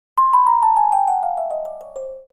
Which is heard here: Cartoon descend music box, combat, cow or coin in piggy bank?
Cartoon descend music box